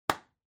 Hand Foley Clap Low

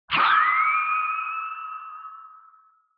Retro Anime Accent Low

